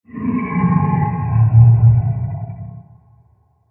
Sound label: Scary Creature Growl Transition Creepy; Scary Soundscapes